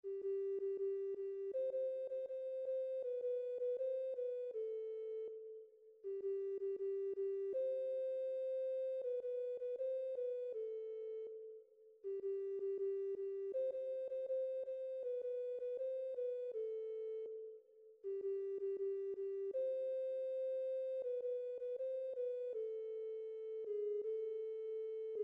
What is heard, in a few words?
PERC